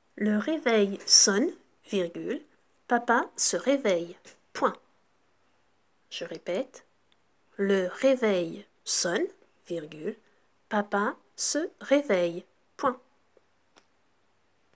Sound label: Vocal